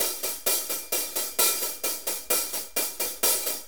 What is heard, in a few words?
4-4 HiHat Loop